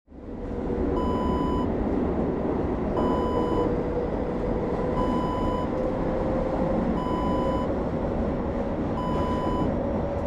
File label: metro int ambience